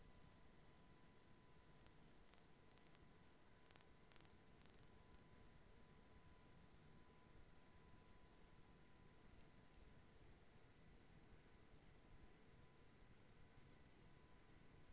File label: HYDROPHONE 16000Hz; JASCO AMAR HYDROPHONE 740